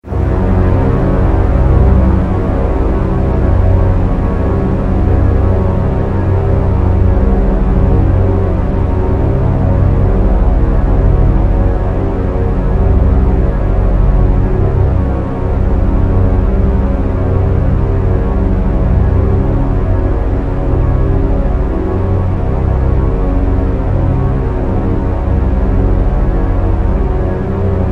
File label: Drone Synth Strings, Drone, Synth, Strings, Stab, Long, Horror; 5.1; BlastWave